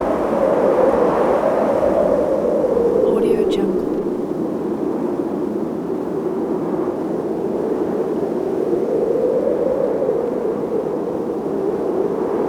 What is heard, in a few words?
wind gusty howl strong blizzard storm